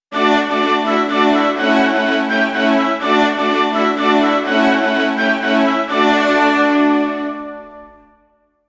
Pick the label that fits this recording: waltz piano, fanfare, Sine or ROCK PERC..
fanfare